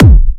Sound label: normal hit whistle